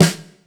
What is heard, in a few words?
SN, Casio CTK-5000